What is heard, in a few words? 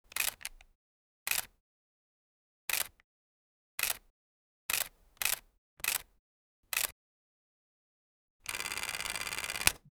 camera focus locking soun